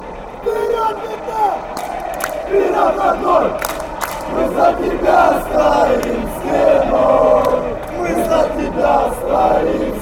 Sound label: chant, fanchants; metalist